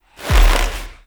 Drifter Pull; Warframe; NineteenNinetyNine; Stage12PartyOfALifetime

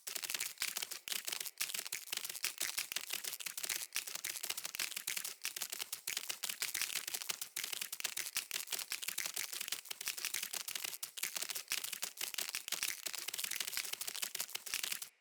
Root Spider Mover Step Loop, Foley; Animals

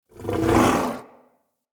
stag headbutt; Powers; Stag Pact

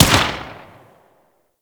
Bladed Pistol Shot; Warframe; Weapons; Tenno

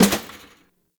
Stadium Snare Destroy; Warframe; Ambience; Nineteen Ninety Nine

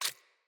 Root Spider Step Water, Foley; Animals

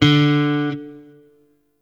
Shawzin Oct Three D; Warframe; Sentient Shawzin

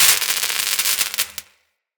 UI Crafting Spark

